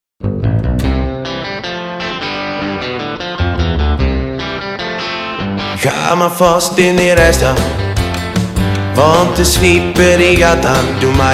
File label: Sweeper Jingle